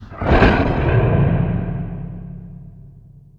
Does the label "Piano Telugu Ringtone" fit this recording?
No